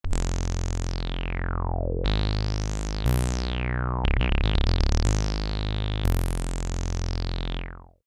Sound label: evo sub